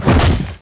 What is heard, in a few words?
scene appear; fallentitan